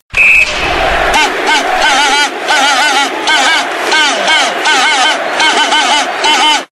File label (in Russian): Gul stadiona ovacii kryakalki; Футбольные кричалки.